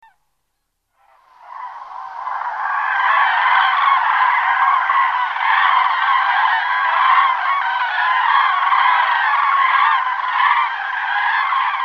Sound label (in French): grues